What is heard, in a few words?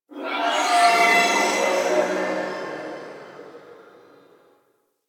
Gas Dist Energy One Off; Ambience; Warframe; Corpus Gas City